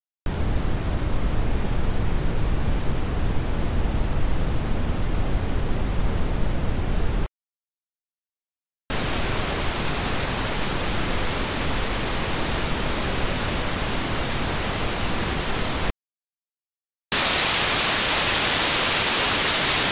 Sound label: Brownian Noise Pink Noise White Noise Samples